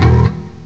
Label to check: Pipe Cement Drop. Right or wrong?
Wrong